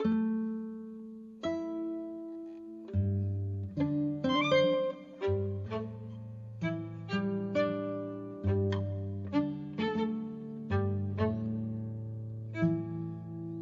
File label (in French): valse; Spectacle à remonter le Temps...